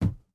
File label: footstep burlap wood jump land; Rust